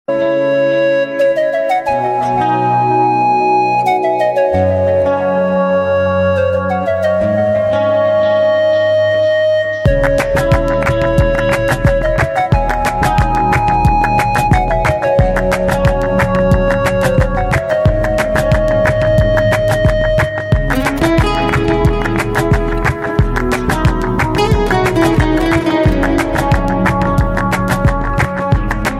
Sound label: Ambient Chillout; Ringtones